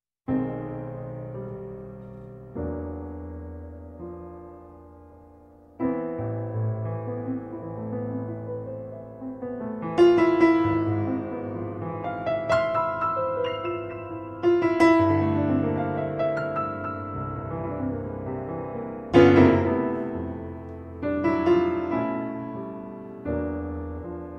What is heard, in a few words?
trio